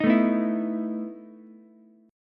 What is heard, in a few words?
E Major 7th Suspended 4th, Ukulele; strummed; JGuitar